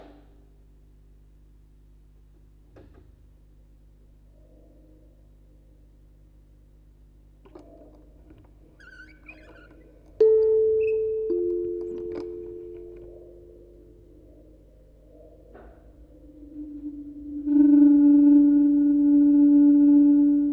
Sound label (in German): Aufnahme Spieluhren Quartett 4 Kanal stereo